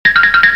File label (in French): Son formule magique, Cartoon; bruitage